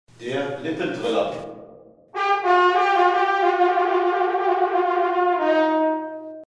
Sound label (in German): Lippentriller